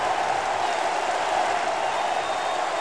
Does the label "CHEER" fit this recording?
Yes